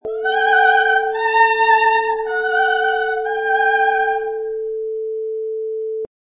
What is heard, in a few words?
Female Operatic "La La"